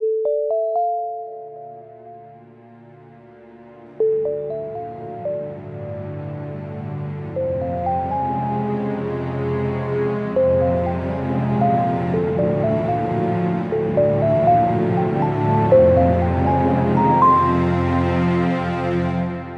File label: Eerie